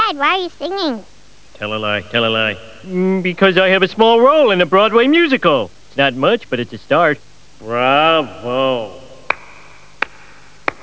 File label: 3 claps; Simpsons